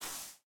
slide; Smite; Baron Samedi

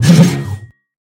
swing; smite; chaac

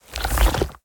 sand; smite; Anhur

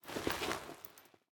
armor; smite; poseidon

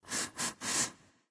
sniff quick; odin; smite

